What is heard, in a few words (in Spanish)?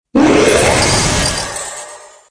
Grito de Enamorus; Gritos de Pokémon de la octava generación